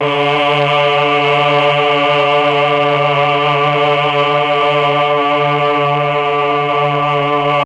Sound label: CHOR; ILIO; Propeller Island Legendary M400